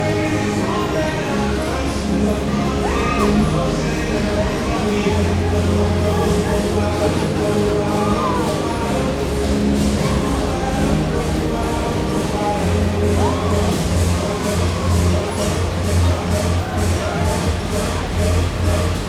megegyszer hullamvasut vidampark

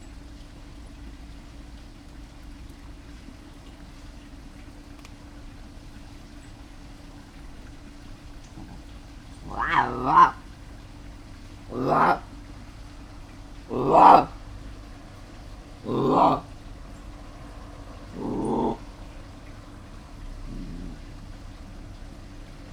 éjjel legtöbbször, mocsári hiúz; jaszberenyi zoo 2016

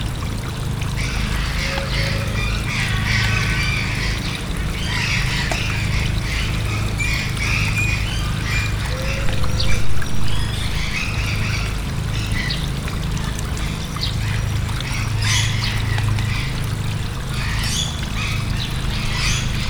halat kap, csőrének hangja, marabu; debreceni zoo 2019

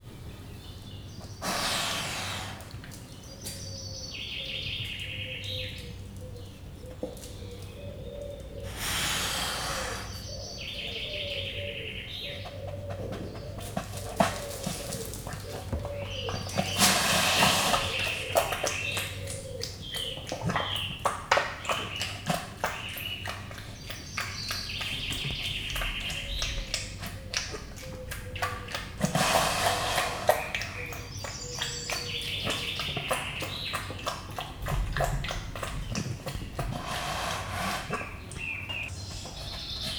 lakmározás vége kisebb zörejek, sörényes hangyász; jászberényi zoo

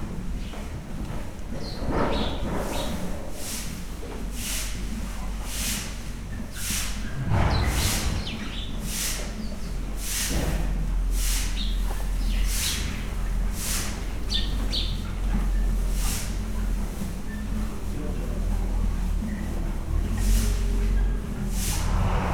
istálló seprés munkagép zaja, ló; Hortobágyi Nemzeti Park 2013; máta

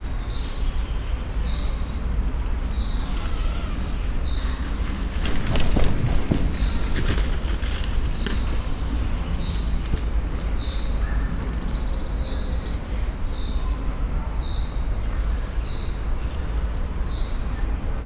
farolfoldre szálló madár, szirti sas; szegedi zoo 2011

